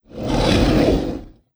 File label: boss dragon main, monster